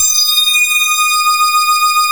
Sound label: PPG sync synth, PPG Wave 1; Greytsounds VOL-1